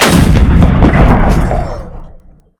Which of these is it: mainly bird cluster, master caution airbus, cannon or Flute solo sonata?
cannon